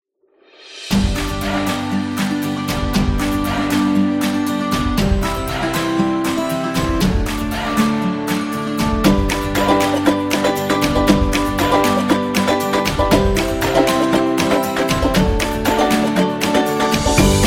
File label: Comedy, Upbeat and happy background music